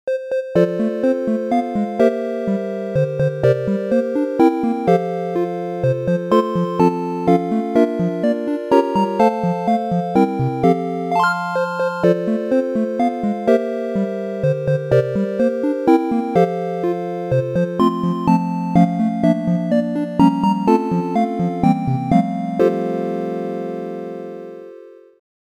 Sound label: Happy Triangular Birthday